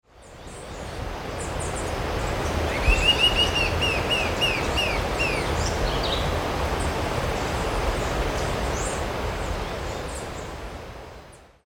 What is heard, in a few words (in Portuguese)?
Sirystes sibilator Gritador